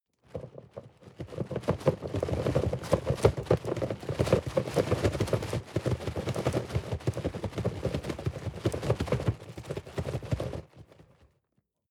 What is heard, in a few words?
cardboard box medium shake long; objekte